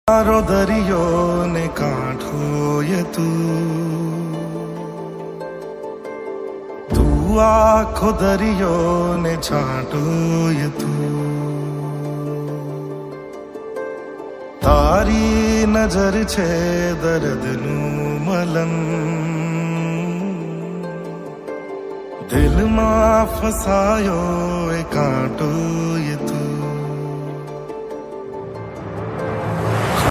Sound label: new ringtone gujarati song; gujarat movie songs